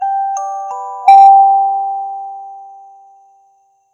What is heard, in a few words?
Notification Tone